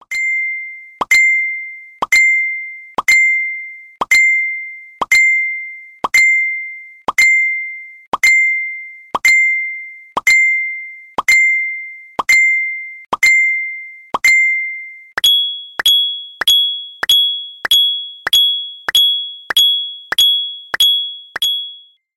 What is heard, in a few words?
messenger ringtone; android